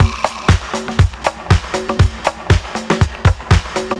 platform chic, Deep House 120bpm

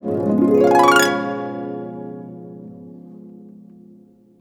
Harp; musicradar; cartoon caper

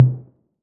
808 MT Orig, Tom; Goldbaby Tape808 & Tape909

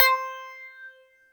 THROATY C5; Synth

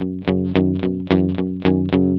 ROCK, Vintage Blues Guitar; USB Soundscan vol.22; AKAI; ROCK2

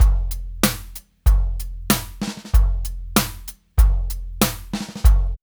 DRUMS, Funky Ass Loops; East West

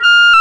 WND CLARIN, Clarinets; Clar Bryt

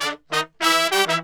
100, BRASS SAMBA

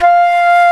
flute F4; E-MU Formula 4000 Series Vol. 5; Protozoa; Proteus2 Presets

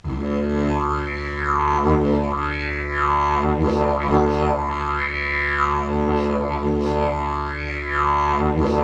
Digiridoo

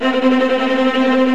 TREM STRINGS

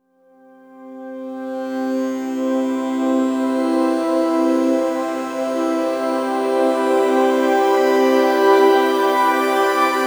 Long Hi Complex, Complex Wave

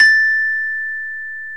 VIBES BELLS

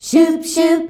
"SCHUBSC" E, FEMALES

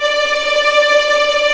D5 TREM, VLNS TREMOLO, String; East West; The Ultimate String Collection

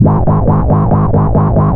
EMPTY BASS; Big Beat Samples & More

